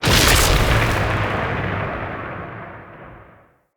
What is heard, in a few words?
Tesla Mini Game Shot; Encounters; Ode Pollution Tower